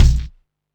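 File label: MISC Left, Techno 138 BPM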